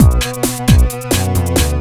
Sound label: 2 Step 133 MIX, House; USB Soundscan vol.51; House Side Of 2 Step; AKAI